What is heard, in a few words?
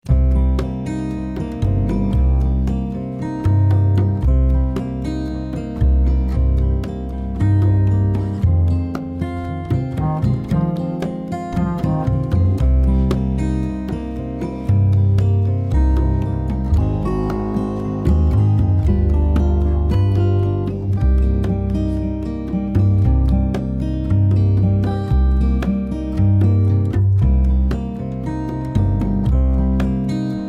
Latin Minor Blues in Eb; note 6 C; blues workout